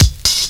120 DISCO; USB Soundscan vol.20; Fresh Disco House I; AKAI; DISCO ADD

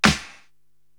SD EZ R&B, Korg TR-Rack drum machine

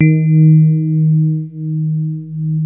DX ROADZ SFT, FM Paired Tines; E-MU Producer Series Vol. 2 – More Studio Essentials; Keyboards

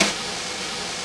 Brush Swirl with Attack Loop; Yamaha DJX-2B

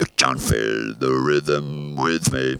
FEEL THE RYTHM, Funky Vocals; USB Soundscan vol.18; AKAI

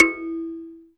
METAL E3, KALIMBAS; Spectrasonics; Heart of Africa

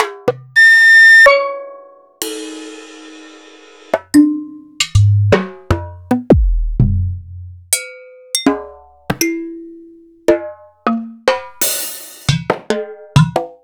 EMU Planet Earth

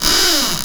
Door Creak, mt-32

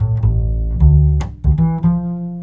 BASS, 150 BPM; Ueberschall; Drum & Bass Flash Trackz

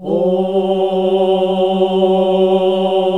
"Ooh" G1, classic vox

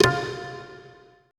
TABLA F1 R; BPC DRUMS

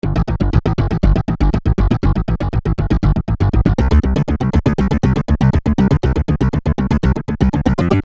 Syn Bass 120 A, Techno Synth Loops; SynBs; 120bpm; Sonic Foundry (Sony Creative Software); Signals I

